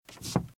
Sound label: Footsteps - Wood - Creaky - Shuffle; RadwinIsland